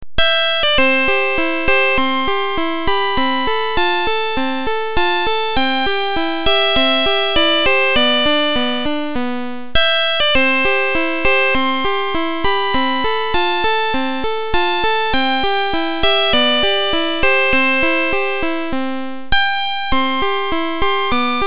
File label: RING SAW